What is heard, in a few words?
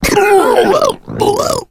female boomer shoved; player; voice; source engine; left 4 dead 2